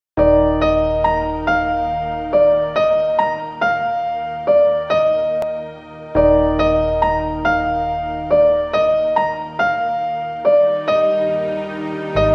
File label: love bgm short melody remix; paiyaa; karthi; tamannah